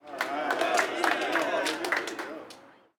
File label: street ball crowd cheer low; SFX Kit; Crowds